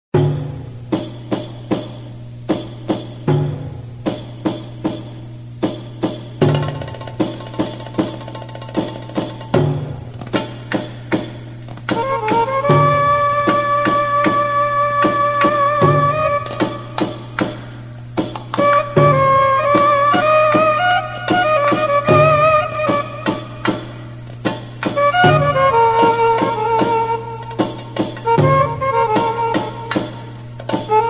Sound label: Arabic songs - arabic songs - musica arabe instrumental; Cançons dels musicogrames